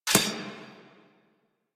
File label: Rising Blades Sword Impact C; Warframe; Warframes; Pax Duviricus